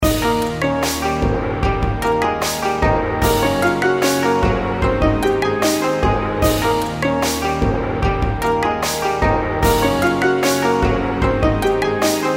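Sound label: outro background music, dragon boat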